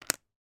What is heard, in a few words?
toy guess who flap down